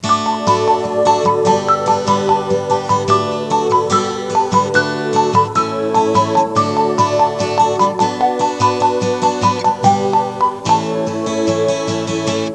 theme; Lost & Found - Volume 1; OmniMedia

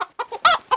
chicken; Pong

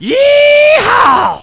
YEEHAW

